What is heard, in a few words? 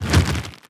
subtle, zombie blind, foley; creatures